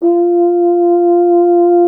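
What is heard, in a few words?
French horn; Miroslav Vitous; Solo Instruments VOL-2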